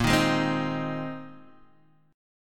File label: A sharp 7th Suspended 2nd Suspended 4th, Guitar, DADGAD; down